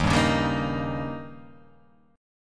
Eb 7th; x,x,1,0,1,2,0,0; Eb7 strummed; JGuitar

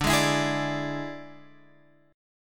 D Suspended 2nd Flat 5th D, Guitar, Open G

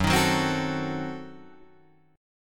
Gb Diminished 7th Guitar Drop D

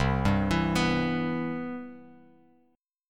C Major 7th, Bass Standard; CM7 arpeggiated

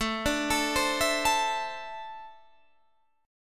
A Suspended 4th Flat 3rd A; A4-3 arpeggiated

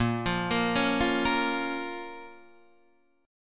Bb Minor, Guitar, Half Step Down

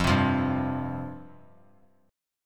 F Suspended 2nd F; Fsus2 strummed; JGuitar

